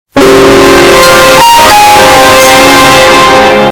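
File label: game over bass boosted; botw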